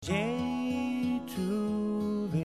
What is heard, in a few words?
Mi Maj